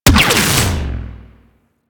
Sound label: Gas Laser Cannon Biped Fire; Warframe; Corpus